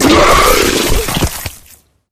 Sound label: flesh destroyed large; flood